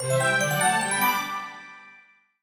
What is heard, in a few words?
game started